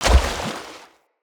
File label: Gallop Water, Animals, Foley, Steps; Bromius